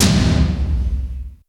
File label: D70 drums; Crypton - DrumBase5000